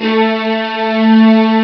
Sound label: strings 16', Amiga Soundtracker Sample Packs; ST-XX Amiga Soundtracker Sample Packs